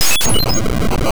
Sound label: holy water; castlevania